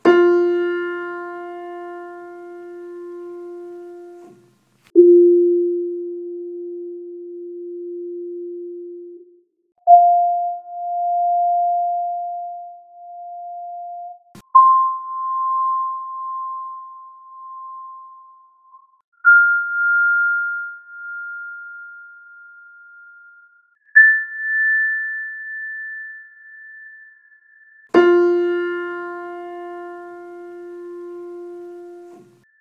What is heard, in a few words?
Detuned F4 Partials, piano